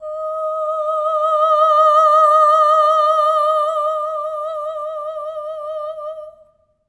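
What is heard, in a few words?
Soprano Oh D#5, Voices; Spectrasonics; Symphony of Voices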